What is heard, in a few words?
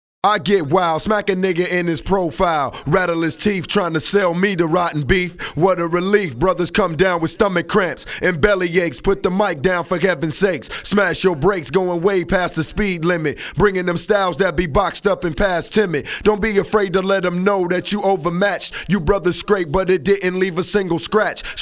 Hip Hop Essentials A Capella